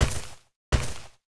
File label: Jewel Tree Walk; Seer; GamigoNA